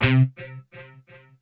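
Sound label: mute distortion guitar c4; PC Gamer IT CD 47; Centauri; alien-x-fire-demo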